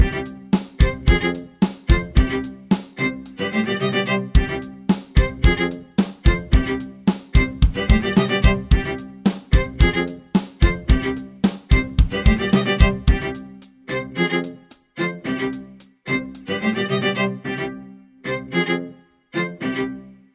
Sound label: Violine Hip Hop Beat